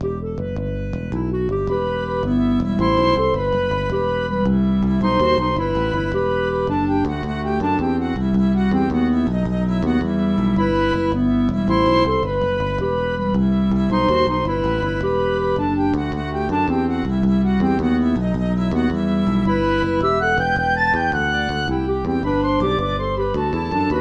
Tarantella; MTWFC VOL1 Audio Accompaniments

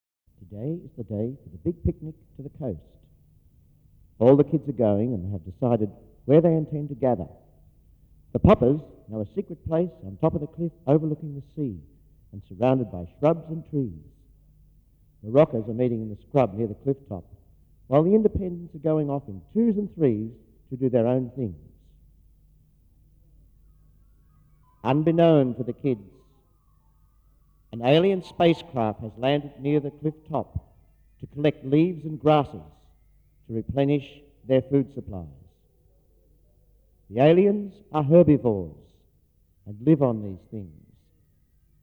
Backing track

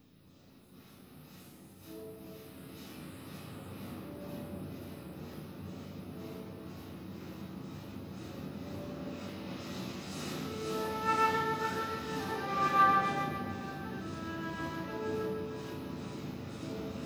Backing track

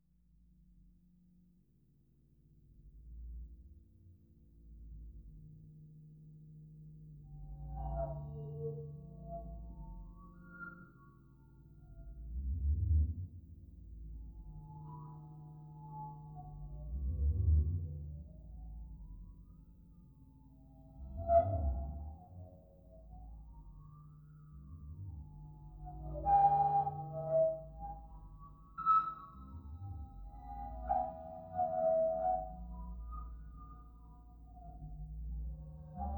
Backing track

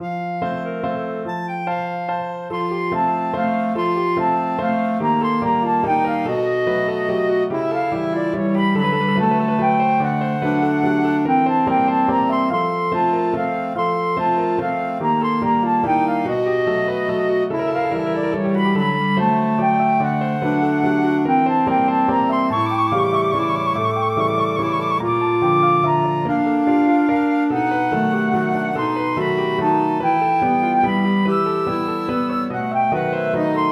Waltz; MTWFC VOL2 Parts1&2 Audio Accompaniments

